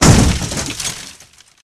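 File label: brute impact medium, vehicles; Dystopia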